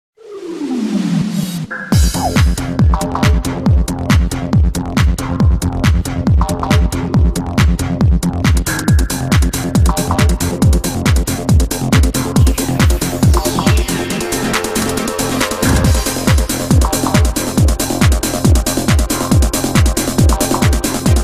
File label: Yamaha CS6R Song